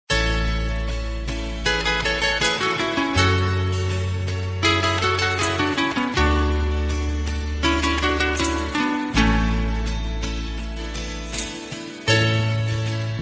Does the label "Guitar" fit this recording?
Yes